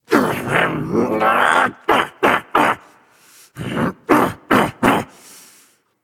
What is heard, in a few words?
grumble; smite; voicepack; guanyu